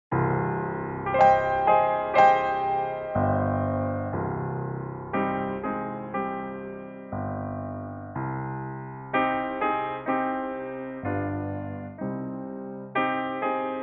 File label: piano